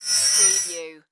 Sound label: Chime Metallic Swell Fast